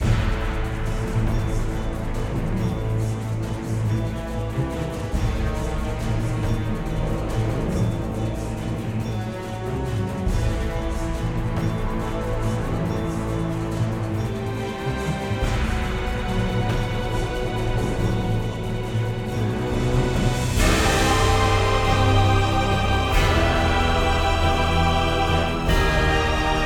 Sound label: Battle Loop; Hell Warders